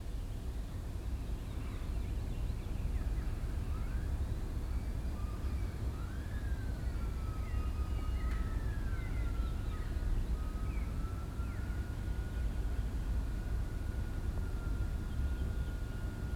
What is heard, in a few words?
low Pitch; lighthouse-v2